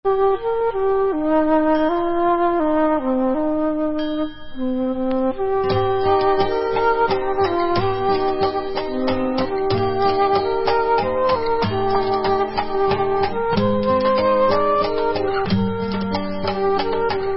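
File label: Instrumental, Israeli Dances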